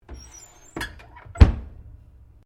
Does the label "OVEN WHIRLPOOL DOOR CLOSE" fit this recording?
Yes